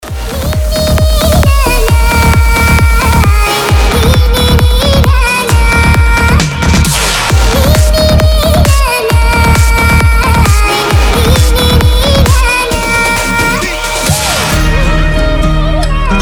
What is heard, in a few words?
flamenco